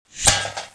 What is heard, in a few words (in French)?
EPEE; MOBICLIC145